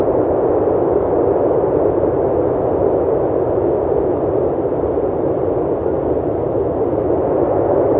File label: engine plane air; War Thunder; engines